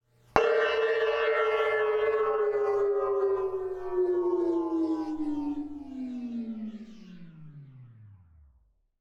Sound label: Cymbal Slowing Down